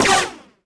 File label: droideka blaster; weapons